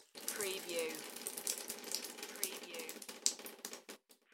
Dripping Water from Shower; beanstalk audio

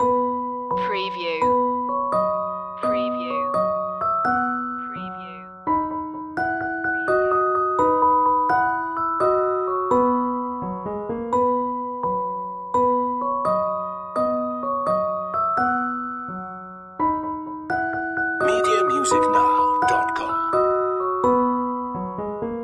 row row row your boat instr loop

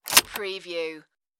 car key insert and pull

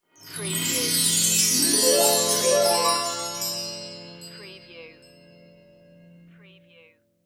Musical

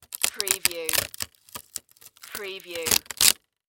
Wood Break Crack; Sound Effects Bible

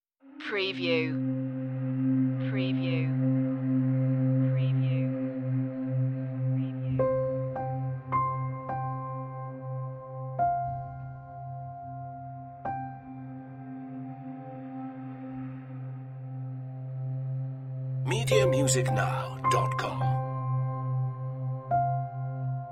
relaxation no sound-fx no choir

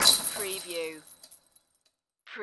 Basketball Chain Net; sound effects bible

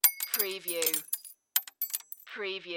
Debris Glass Drop

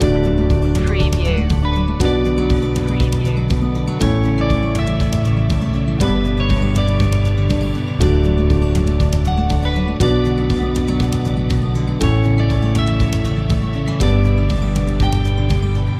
corporate acoustic loop

